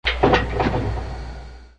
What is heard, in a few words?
factory spin bump, Factory Percussion